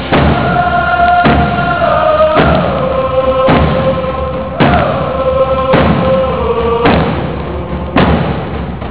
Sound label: tomahawk